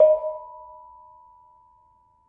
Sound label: Bonang Barung, gamelan